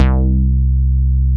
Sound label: BASS MONO